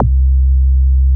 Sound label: MOOG HI C2, ANALOG BASS; Music Store - Claudius Diemeter Schwerlos